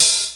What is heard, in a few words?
hat open high ring thin, Chicago House Drum Hits